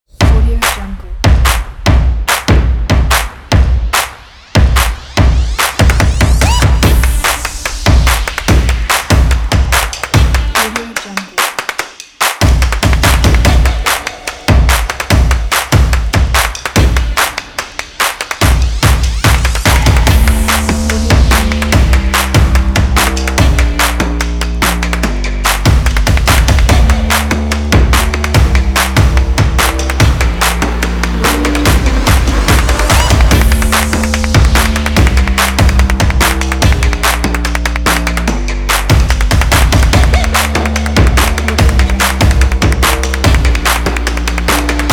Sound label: stomp percussion promo